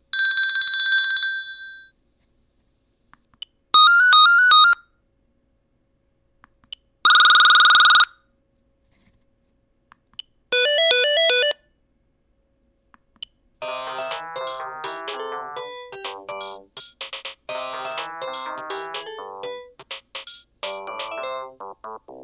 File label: ring tones, cordless phone; Gigaset A580IP SIP-DECT cordless phone system; GIGASET A58H